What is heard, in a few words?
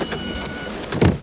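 close door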